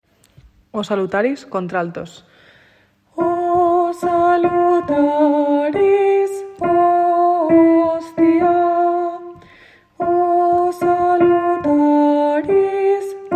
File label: O salutaris alt